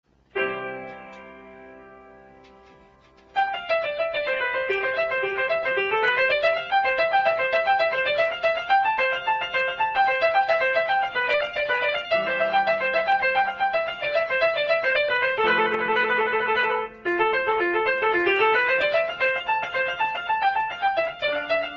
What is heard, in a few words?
Verso piano